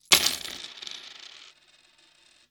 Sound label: coins table